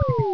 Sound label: app error; Game Voice; HawkChrome